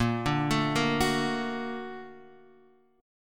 Bb Augmented Major 7th Bb, Guitar Half Step Down

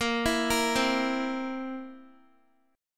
B flat Suspended 2nd Flat 5th chord, down, Guitar; arpeggiated

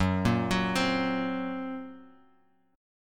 F sharp Major Flat 5th F sharp Guitar Open G

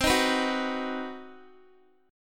C Minor Major 7th Flat 5th chord, Guitar

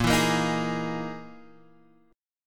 E Major 7th Flat 5th A sharp

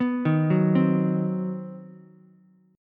C Minor Major 7th Flat 5th chord, Ukulele; arpeggiated; JGuitar

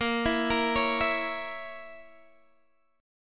Bb Diminished Bb down Guitar DADGAD

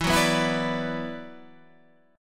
F sharp 7th Suspended 4th Sharp 5th

